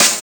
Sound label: BRASH SNARE; Drums In Your FACE!